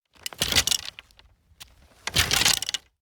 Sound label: golf bag setting down